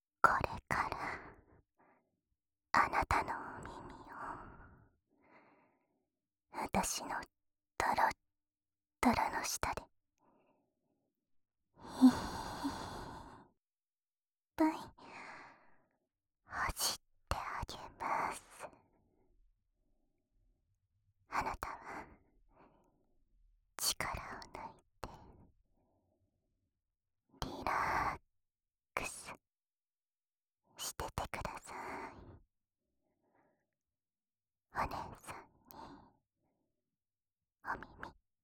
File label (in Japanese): 『お姉さんの耳なめ（右耳）』, ASMR